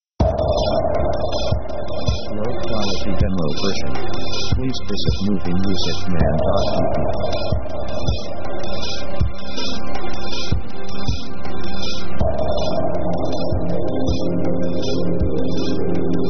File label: fateful theme; synth themes